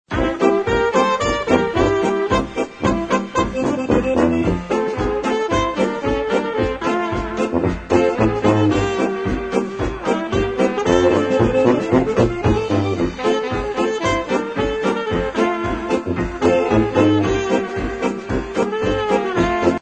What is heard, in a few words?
HAPPY BEAUTY POLKA